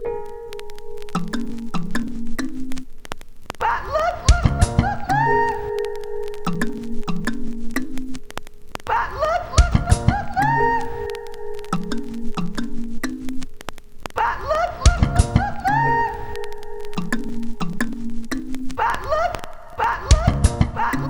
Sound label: Melody, Hip-Hop